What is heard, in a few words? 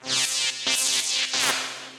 Circuit, effects; Electrix Circuit; Ableton; Factory Packs; Punch and Tilt